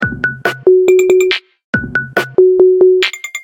Lectro Beat, notifications; Fujitsu T-02D

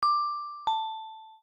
hourly alert; Samsung Z3

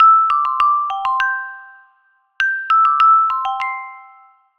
Music box, ringtones

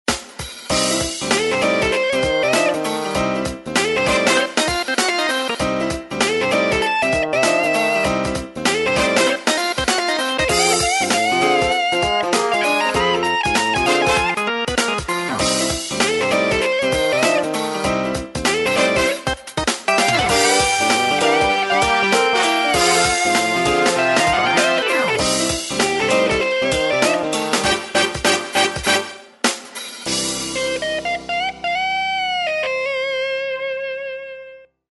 Blues; MX310

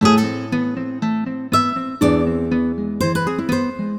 Classical; ringtones; Twirl Away; Google; NewWave Labs

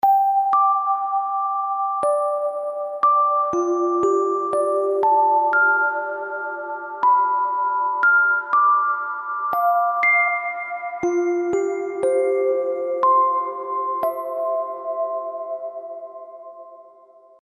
Restoration; LG; AX840-Tritan

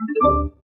connect tone; Samsung SGH-i560

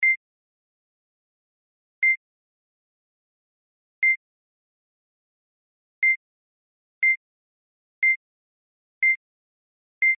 camera timer 10s, System Sounds; Sony Ericsson U1i Satio

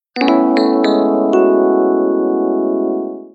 Message Alarm, notifications; SK telesys SK-S150

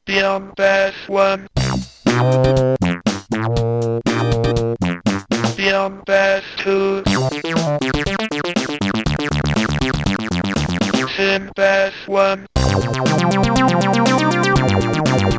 Bass 2op; Yamaha SMAF demo ringtones; VLA-MA5

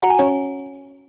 disconnected, Sony Ericsson; Z520i

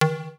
Plastic Pipe, notifications; Android; Google; Android Open Source Project

